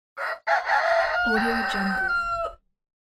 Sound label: cock crowing